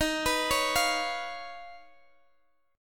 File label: Eb 7th Suspended 2nd Sharp 5th Eb, Guitar Standard; arpeggiated; chord